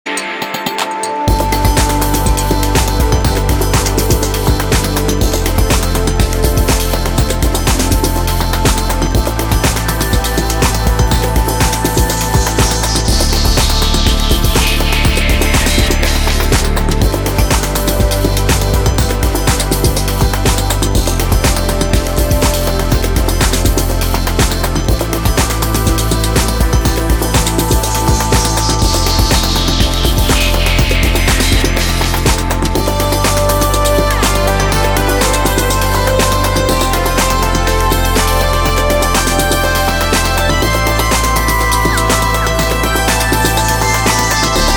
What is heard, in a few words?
fuzz jazz